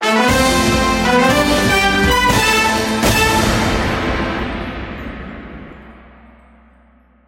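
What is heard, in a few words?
sounds of fanfares and solemn award ceremony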